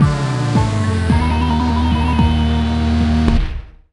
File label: Sting Mission Failed; Cockroach; Monsters vs Aliens; NDS